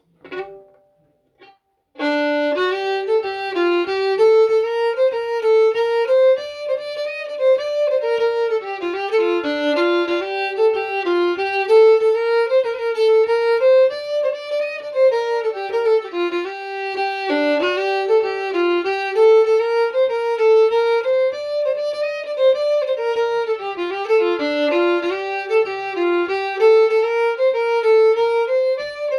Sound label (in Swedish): Himlens polska